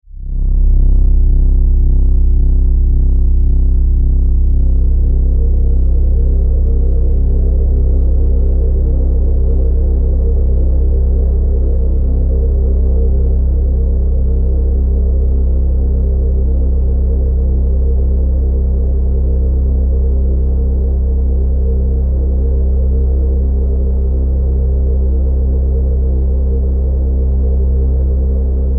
Drone: Eerie, Menacing, And Atmospheric Monotone; Drones; Sound Design; Stuart Duffield

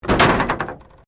Wreck Door Panel Clunk Metal

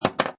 Pinball Sound Ball Drops; Video Games

